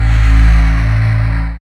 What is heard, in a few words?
synth digital, dance synth; Sound & Vision - Gigapack I CD 2 (Roland)